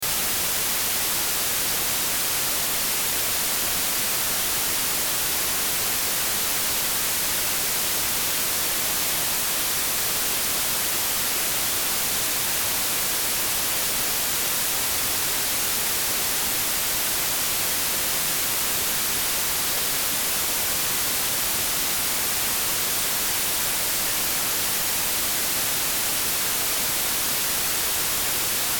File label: Test Tone White Noise -12Db Loop; 60 Sec; Blastwave